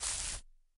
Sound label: grass footstep